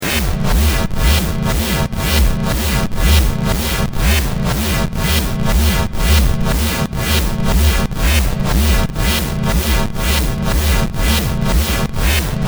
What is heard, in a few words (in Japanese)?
fm, FM系音色3 (FM系音色2にさらにサブオシレーターを加えたもの); 魁Reaktor道場